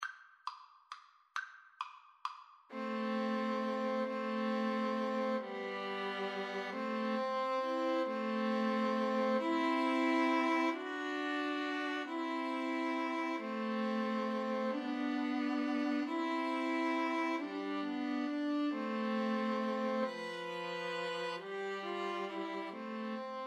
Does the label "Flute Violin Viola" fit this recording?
Yes